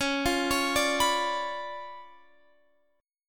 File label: C sharp 7th Flat 9th, Guitar, Open F; C#7b9; arpeggiated; guitar chord